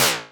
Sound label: short noise zap